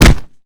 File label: long kick